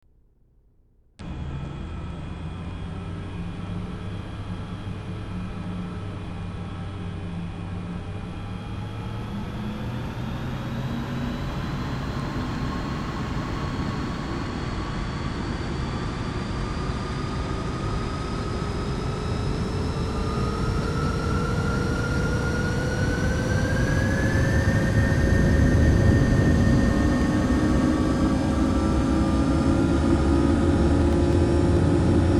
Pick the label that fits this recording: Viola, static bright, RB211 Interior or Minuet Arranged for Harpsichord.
RB211 Interior